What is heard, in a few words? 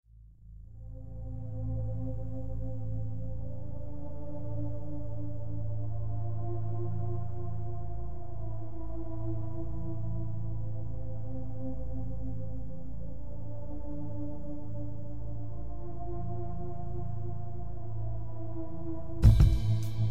Am100 Groove; Rameau